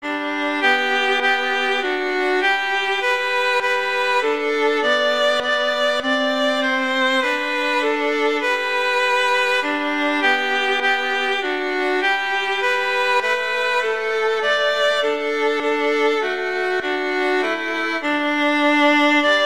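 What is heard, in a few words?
Hark! The Herald Angels Sing, Violin Duets; Christmas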